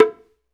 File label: PRC, Bongos; Spectrasonics; Supreme Beats; World Dance